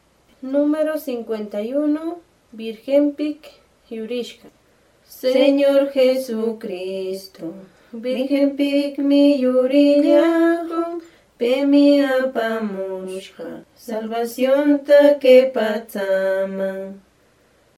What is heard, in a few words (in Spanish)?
cancion